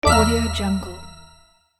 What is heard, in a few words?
cursed gem